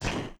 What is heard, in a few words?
scorch, weapon